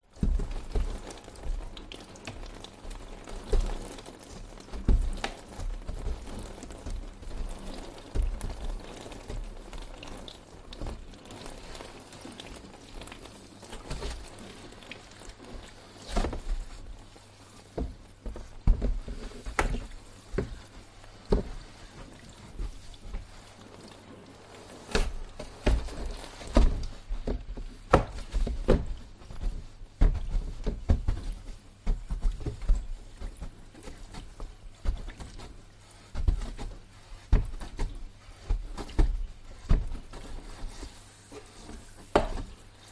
household windows being cleaned window cleaner pole brush pole drips internal; zapsplat